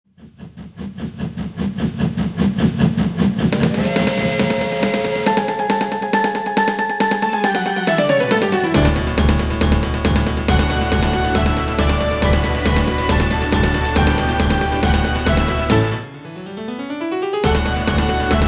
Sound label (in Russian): pesenka parovozika minus